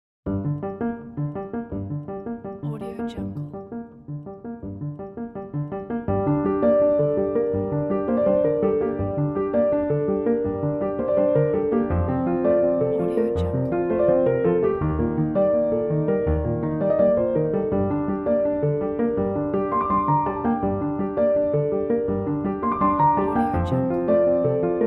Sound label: abstract sad piano